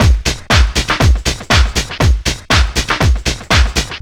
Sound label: house loop